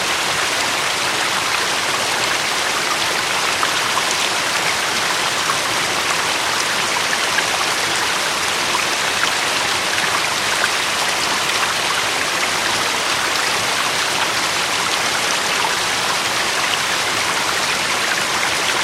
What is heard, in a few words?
river